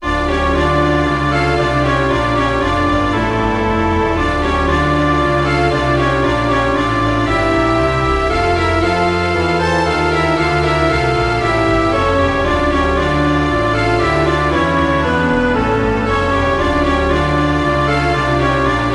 spielmusik orchesterpartitur

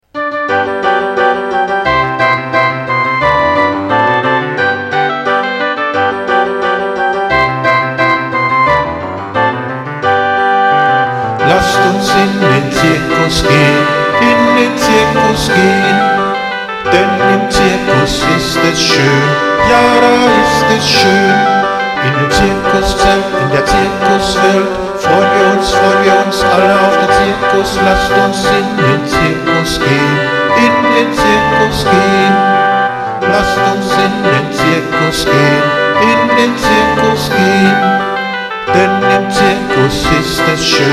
zirkuslieder

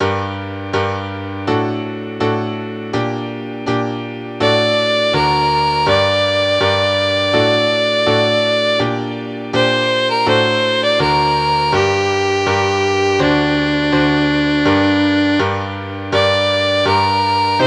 violine u klavier

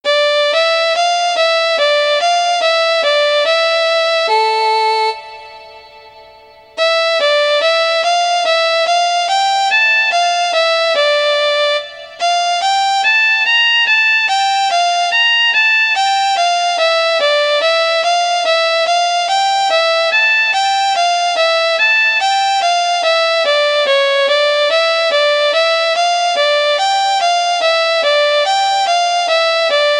violine solo; a moll; vivaldi

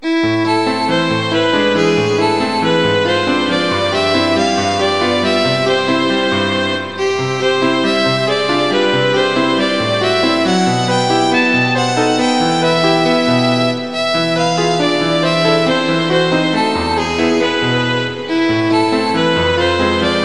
violine u klavier; kinderszenen; fast zu ernst

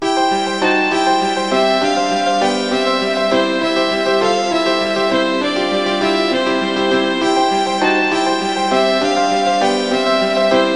violine u klavier; kinderszenen; ritter vom steckenpferd

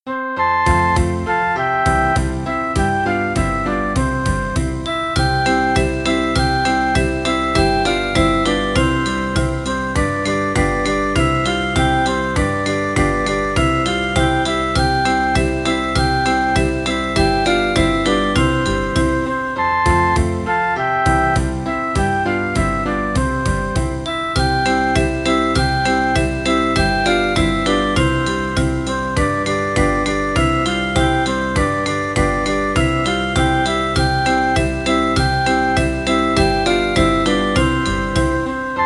zirkuslieder playback

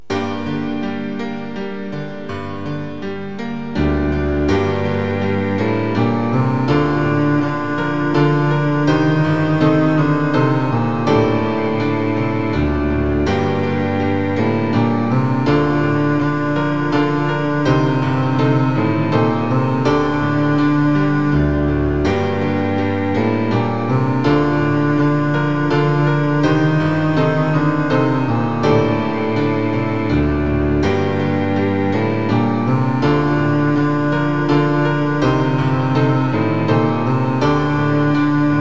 elegie celloeinspielung

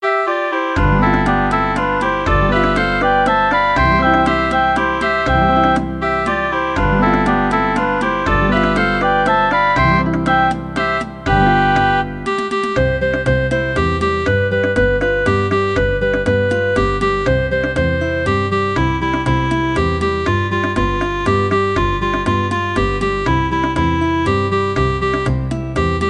kinderlieder mueckenkanon playback

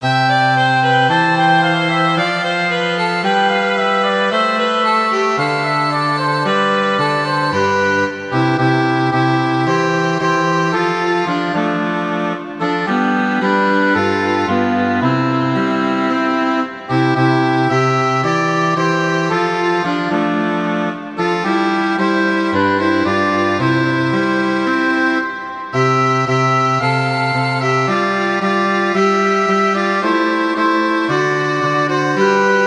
2 violinen u cello

